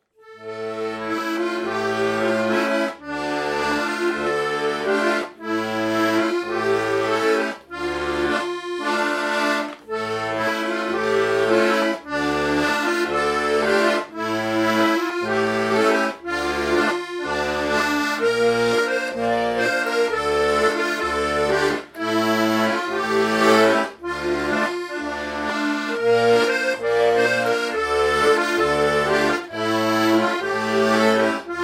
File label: Korobushka